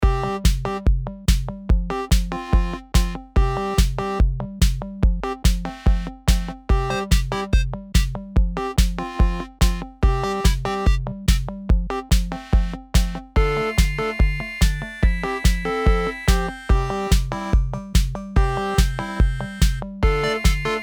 free games music; Step Back 7's

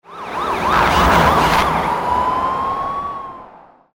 police car car pass by; Beat the Bobbies 2

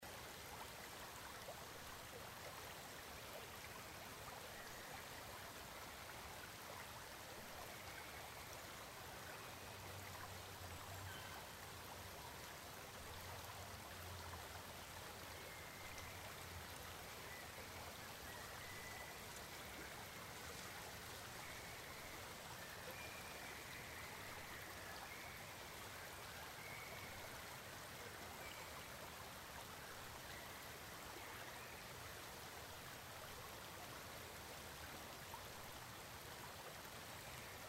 bg ambience; Wild Koi; Eyecon

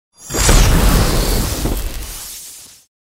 Giant Wild Ball Appear; Bouncy Balls 2

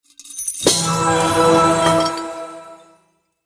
spin win; Eternal Wishes LuckyTap

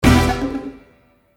Land; Lucha Rumble

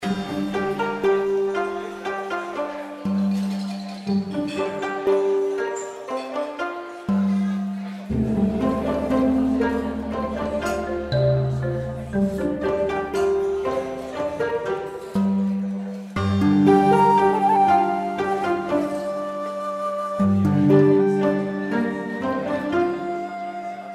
Background Music; Chinese; Pig's Feast

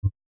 hover; Hot Off The Press; Eyecon

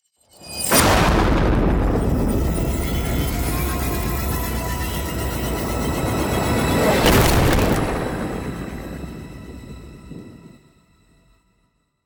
rune animation; Champions of Valhalla

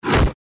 body Slam; Jaguar; Lucha Rumble

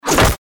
body Slam; Lucha Rumble

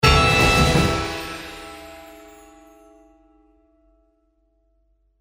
instant Win; Highland Reels

